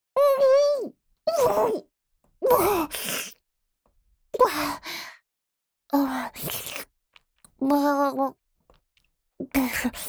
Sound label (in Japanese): 菓子を食べ終わる; 賞味抜粋集; 九州銘菓(?) ぴごずいき